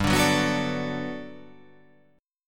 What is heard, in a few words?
G Major 9th, G, down